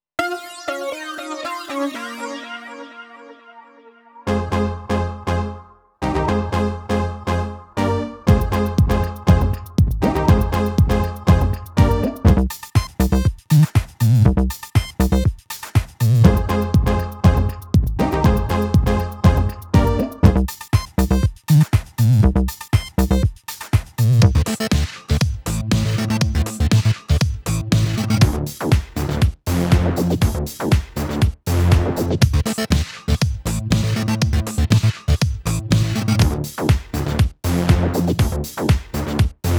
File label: mpc5000 electro house; akai